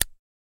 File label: Zippo Lighter Close; lighters & matches